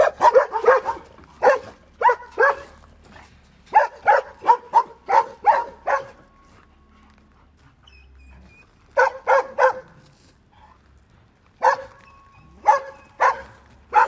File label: Dogs barking